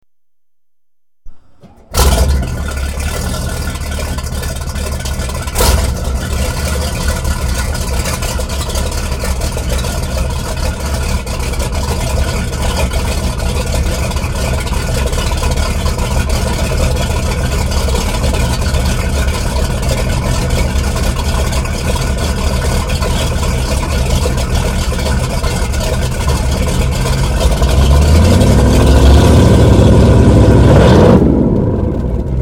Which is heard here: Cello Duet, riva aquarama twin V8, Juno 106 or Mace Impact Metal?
riva aquarama twin V8